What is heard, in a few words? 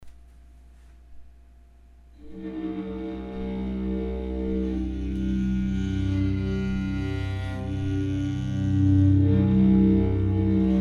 Redwood Bass Baritone, bowed psaltery